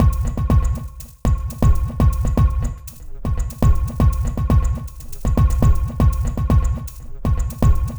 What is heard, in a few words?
Candy Machine, Processed Percussion